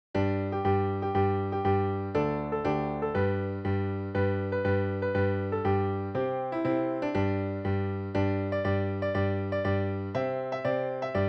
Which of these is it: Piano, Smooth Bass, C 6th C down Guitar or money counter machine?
Piano